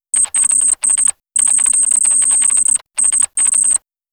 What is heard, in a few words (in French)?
bip